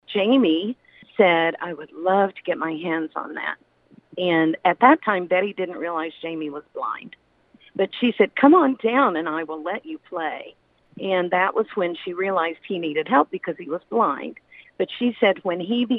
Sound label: piano duo